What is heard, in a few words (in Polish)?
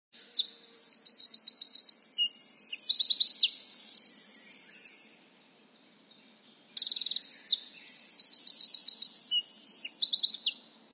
Strzyzyk, Ptaki Glosy